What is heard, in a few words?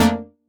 stab pump, stabs